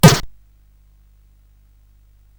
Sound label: Tecnetium, CSG8580R5